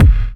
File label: BD; Best Service; Dance Mega Drums